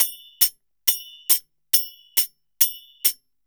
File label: 138 KASI CYM; Spectrasonics - Heart of Asia CD2